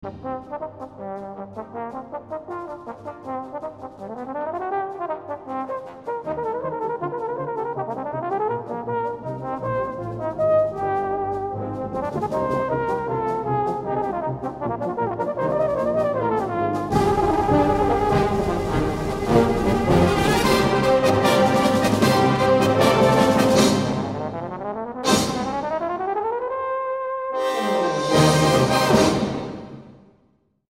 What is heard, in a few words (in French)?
extrait alto